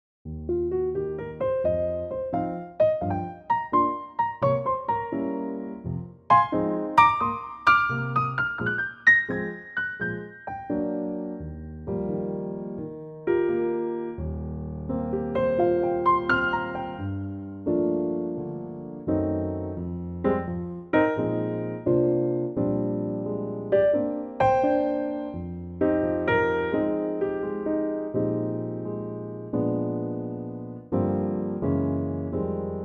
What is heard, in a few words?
Eb piano